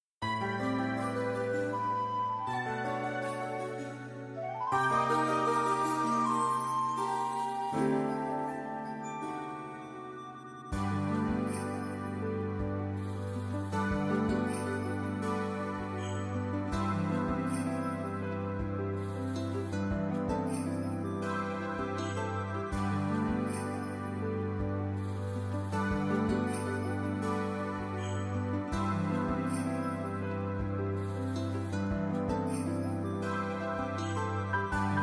ocean, ambience music; PhoenixCraft-1.16.5

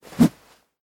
hammer attack; weapons; rust

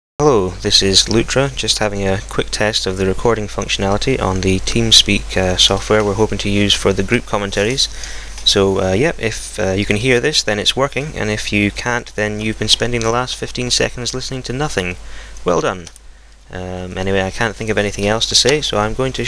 Lute Test; lutra; commentaries; farthing-wood

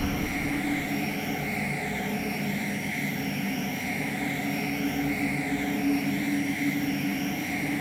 thruster loop; vanquish

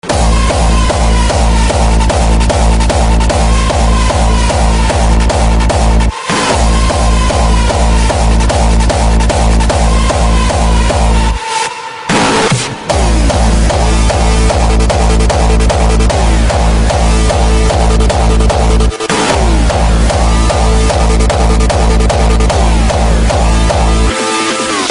rave

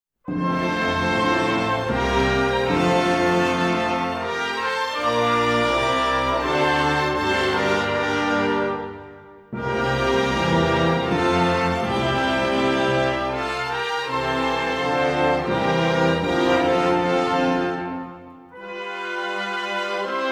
National Anthem of Austria; conductor Jan Kucera; NATIONAL ANTHEMS OF MEMBER STATES OF THE EU; Rakousko

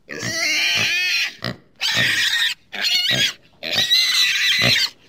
attack, pig; animals; r animals

